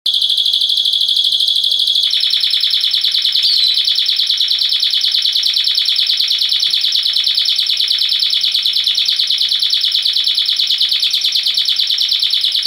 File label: Canary, Bird Songs